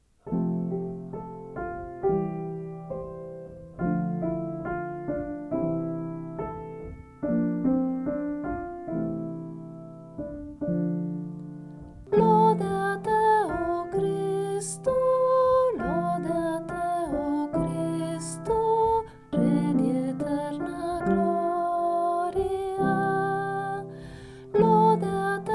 Lode A Te O Cristo

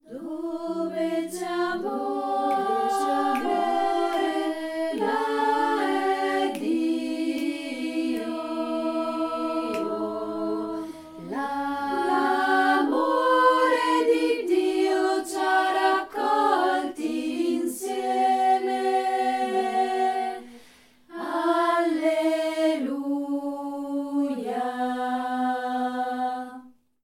Dove C'e Amore, donne

